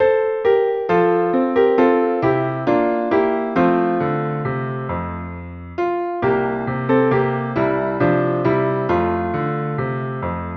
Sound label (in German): Klavier